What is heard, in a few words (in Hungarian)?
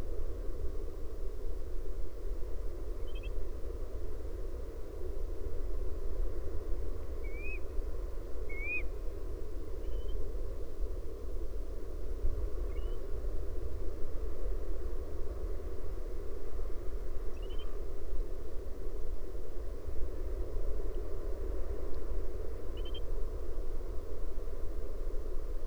kis vocsok Tachybaptus ruficollis